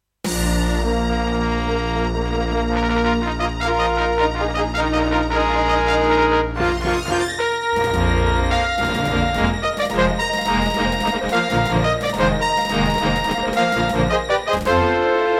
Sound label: Intro, Roland CM-300; Dark Forces